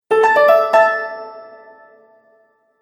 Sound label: Bright Melody Mobile App; Musical; Musical Sound Effects